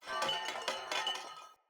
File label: Corrupted Battle Dog Bell Tail Wag Idle; Foley